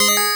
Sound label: Coin; Racing Hero; arcade